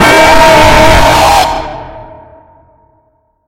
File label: gingerbread screamer; fang